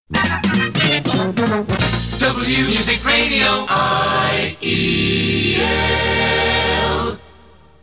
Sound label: jingle